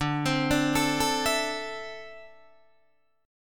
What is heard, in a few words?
D 7th Suspended 2nd down Guitar Open D